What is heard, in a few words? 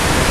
static